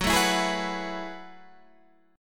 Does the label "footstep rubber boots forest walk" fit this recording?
No